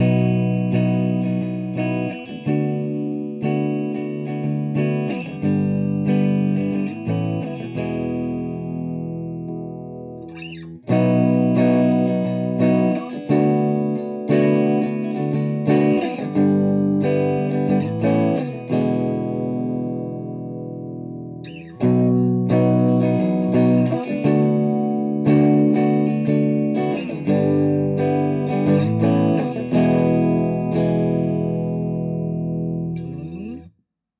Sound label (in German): Verzerrer Pedale Neutral; Plumes